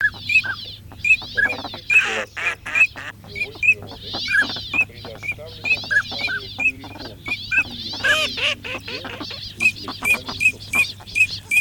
Чирок-свистунок Alzavola Шилохвость Codone; plurifon

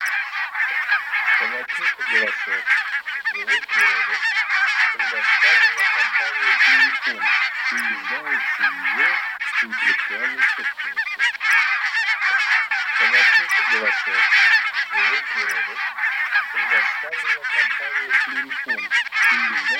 Казарка белощекая Oca Faccia Bianca; plurifon

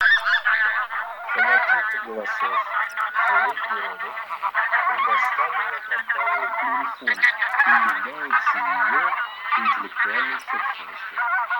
Гуменник базар Oche Granaiolle; plurifon

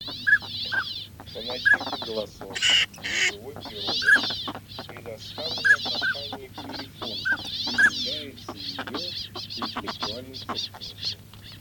Шилохвость Codone

